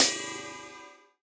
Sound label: Ride Bell